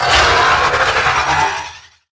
Melee Attack; Necramech; Battle Barks

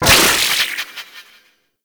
Arcane Generic Proc; Warframe; Mods

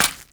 Step Default, Footsteps; Warframe; Grineer; Royal Jester; Foley

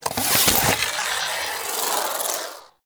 Unequip; Warframe; The New War; Weapons; Omicrus

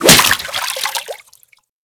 Whip Impact Water, Weapons

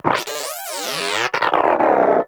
Inquisitive Vocal Death; Pets; Warframe; Moa

